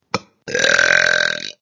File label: Reaction burp